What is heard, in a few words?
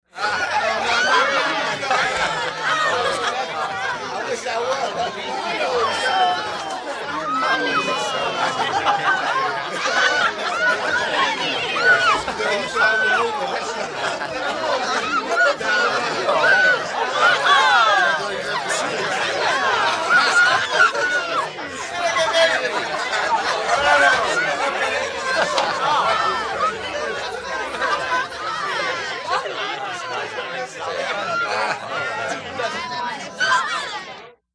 Party Crowd Wild Party